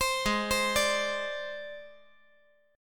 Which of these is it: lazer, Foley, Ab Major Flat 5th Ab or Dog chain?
Ab Major Flat 5th Ab